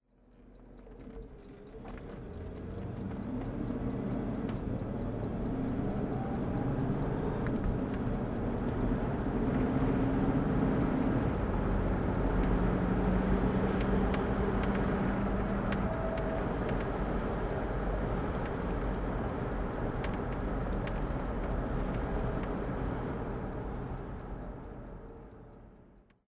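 bentley s3 continental driving, Engine sound driving; Bentley S3 Continental, 1963; V3D/ ClassicarImages; Marc Vorgers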